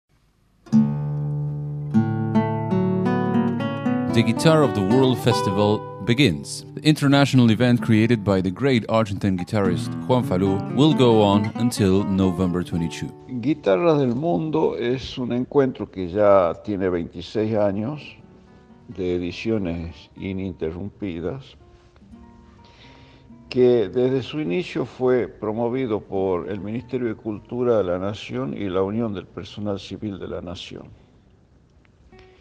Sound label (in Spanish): Guitarras; Ingles